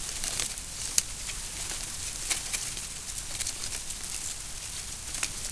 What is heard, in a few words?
landing grass cockpit; War Thunder